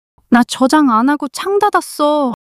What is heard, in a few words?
surprise